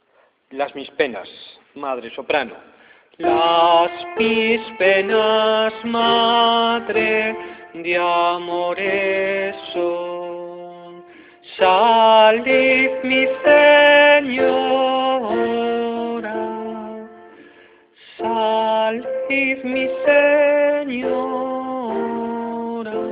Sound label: sopranos